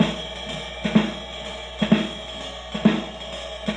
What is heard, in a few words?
Loop, rhythmate, classic machines